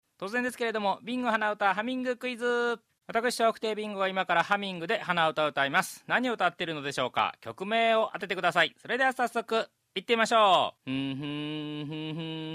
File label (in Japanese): hanauta